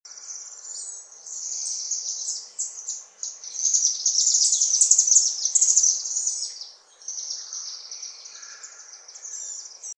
martinet ramoneur

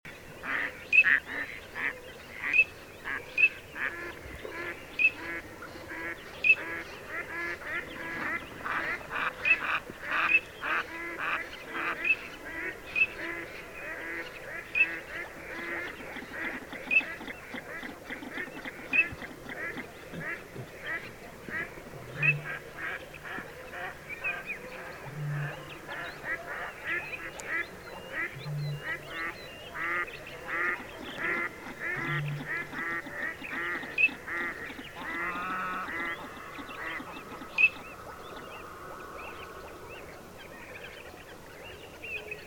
sarcelle d hiver, chant

